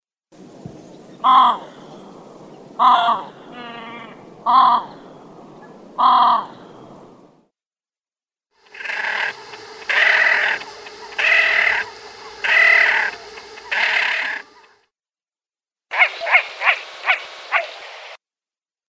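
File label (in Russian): Poganka bolshaja, Great Crested Grebe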